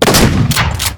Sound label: shotgun shot